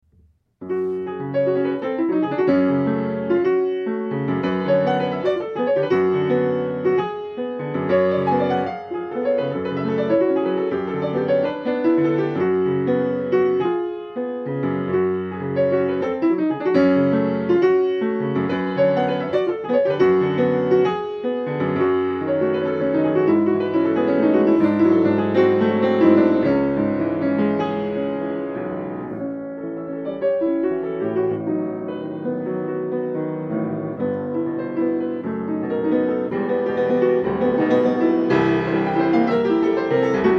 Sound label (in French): etude 2 opus 8 de scriabine